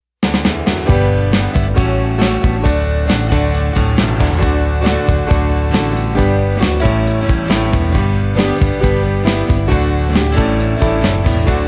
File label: Instrumental; Kids Liturgy; Year A